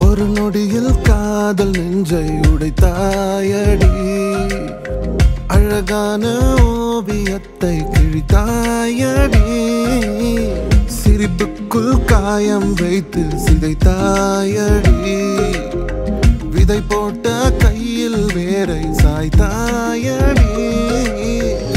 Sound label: Song Ringtone; Telugu song